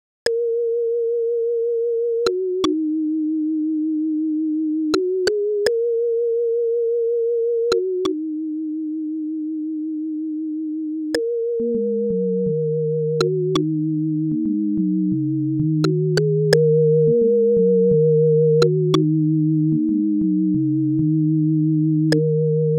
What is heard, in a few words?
Sine Wave